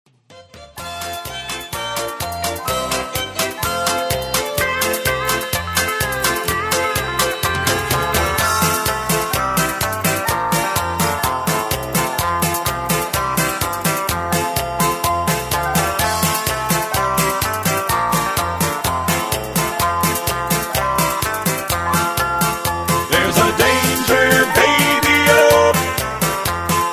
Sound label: Intrumental with BGVs